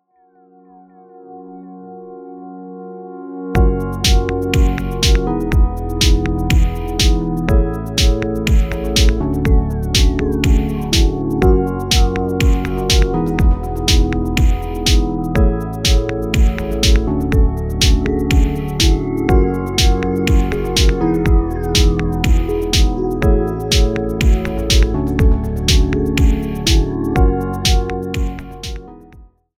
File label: Daylights 122bpm